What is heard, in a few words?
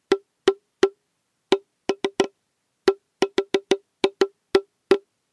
taiko; chi-taiko